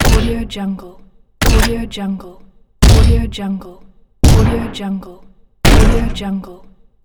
rifle shot

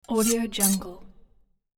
knife sharpen double stroke; hollywoodedge

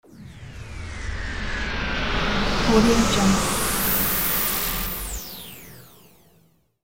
falling sweep splash

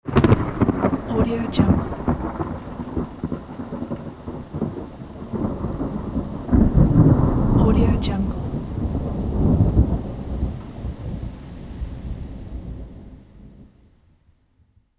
lightning

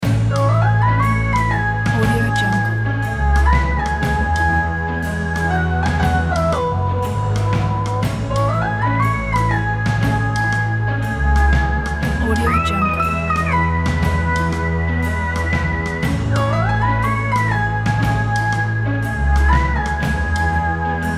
jolly happy day

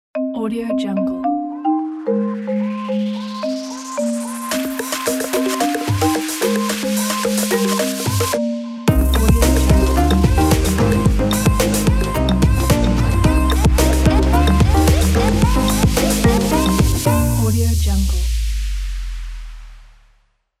bright tropical house logo

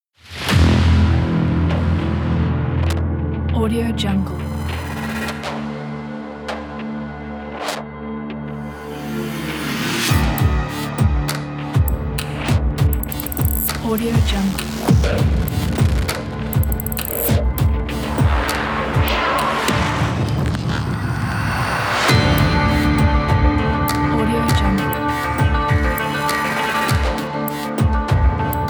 cyber technology epic

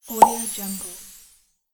magic bubble pop

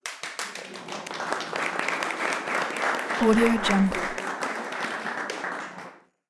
indoor crowd applause; debsound

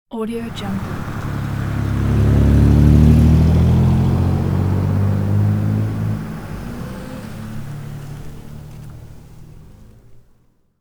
low sounding car driving away in rain

